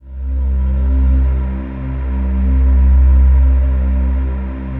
PAD; Best Service; XX Large; Pads

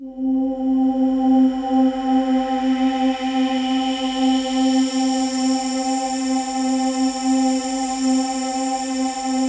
PAD, ATMO PADS; Best Service; XX Large

